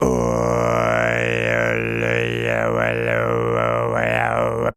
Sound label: tuvan, Voice